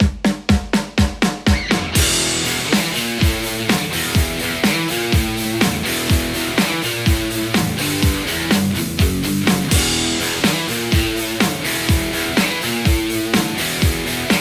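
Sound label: Stratocaster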